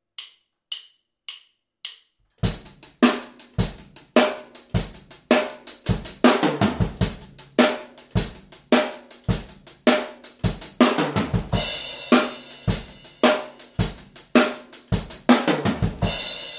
break passe partout, batteur

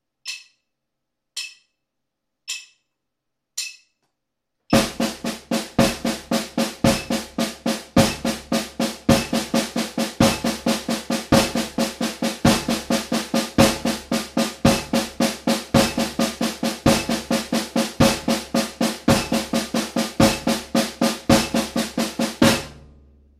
binaire quintenaire

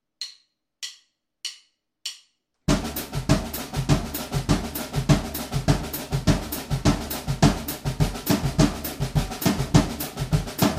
samba batterie accents toms

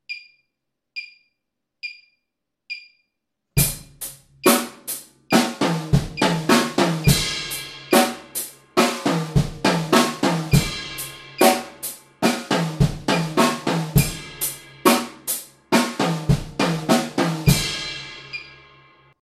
break triolets, batteur

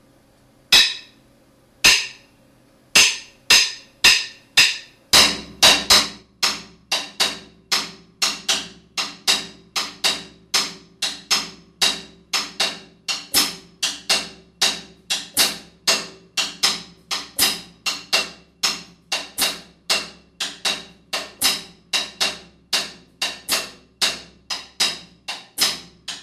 cascara sur cercle

